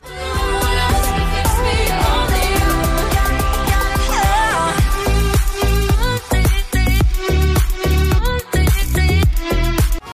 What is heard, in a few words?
iphone 7 plus remix ringtone